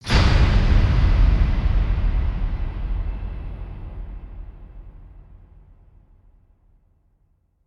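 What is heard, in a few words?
Hit Swoosh Chain Gang; Brand X Music; Brand X Music Catalogue - Editor's Essentials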